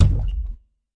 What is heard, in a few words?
fx drum, Electro FX